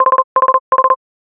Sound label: ringer, chirp